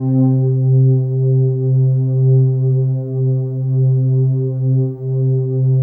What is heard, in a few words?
PAD, Dance Mega Synth; Best Service; 90 s Sample CDs